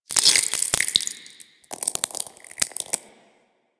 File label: Taunt Flesh; Warframe; Infested; Ancient